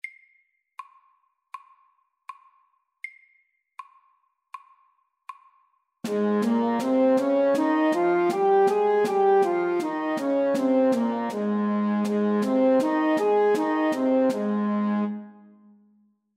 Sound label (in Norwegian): Ass dur natura MIDDELS